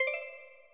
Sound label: hyperlink, Old TalkBack Sounds